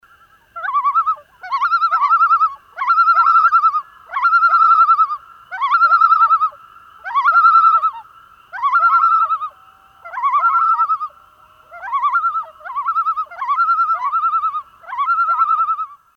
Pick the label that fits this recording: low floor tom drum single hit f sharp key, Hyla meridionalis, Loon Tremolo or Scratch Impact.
Loon Tremolo